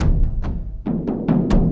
Deenga Banga Ah Doong, Kendang, Drums; Sonic Foundry (Sony Creative Software); Friendly Fire

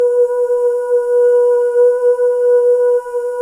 BGV OO

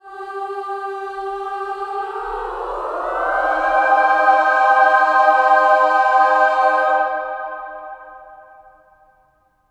SLIDE CHD, Symphony of Voices; GLIDE CHD

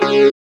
SYN Razz-Synth; Best Service Gigapack 1

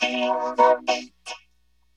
VOCODER FX, VOCAL BITES; Kid Nepro - Elite

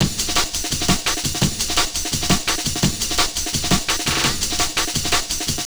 Dope Break, Drum & Bass; Fluid Dynamics

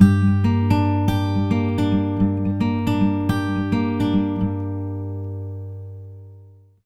110 MIN PICK G, Acoustic Guitar Loops; USB Soundscan vol.04 - Electric & Acoustic Guitar Loops; AKAI

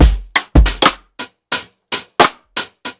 PICCOLO DRUNK, breaks, drums; Basement drums vol 2

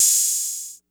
OPEN HAT, TR-808; Hollywood Edge; The Big Bad Beatmachine

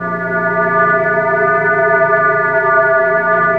A#3 TUBE; Northstar; The Wizard VOL-1; SYNCLATRON

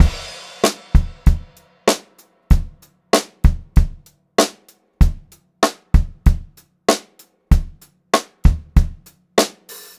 Pop-Rock Drumloops; 96 POP 3

